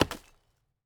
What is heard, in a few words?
lumber tree